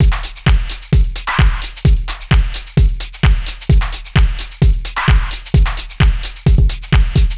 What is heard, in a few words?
Solid Loop 130, Loungin House; Equipped Music